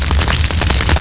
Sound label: flame; Attack; Doom and Doom 2